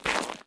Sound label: zombie footstep; DeadFright